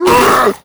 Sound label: grunt hard, zombie vox; creatures